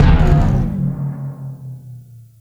Musician Drum Player Hit

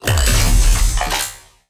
Lasrgun Electron Impeller Charged Fire

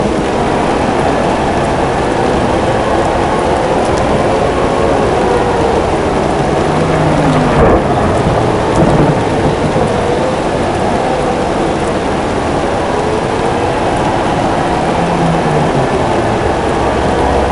Track Ambience Rain; MotoGP 14; Milestone; Bandai Namco; vita

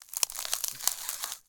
ice

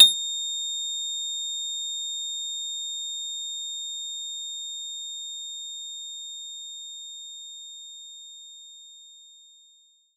Piano Dx5; knights try

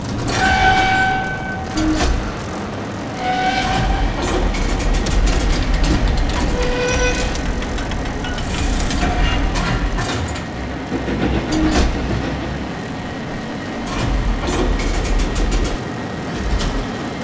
Elevator Loop

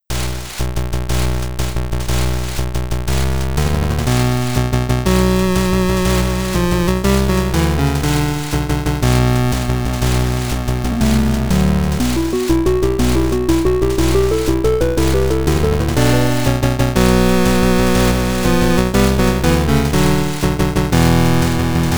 Chiptune

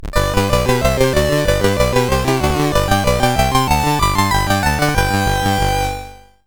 Stage Clear (SID Stereo); Felix; Commodore 64

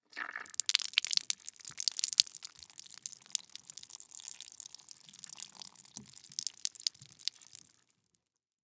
cantaloupe liquid drip; a dump in the dark

